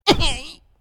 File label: Minion Hit